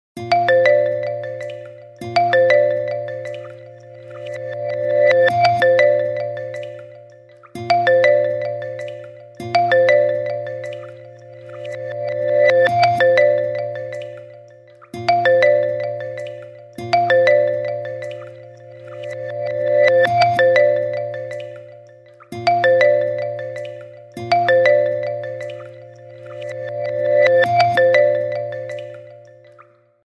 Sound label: Vivo T2X